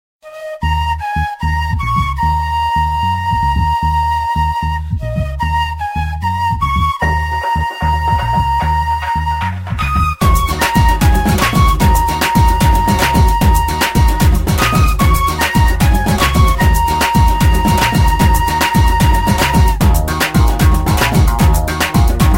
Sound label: Flute Remix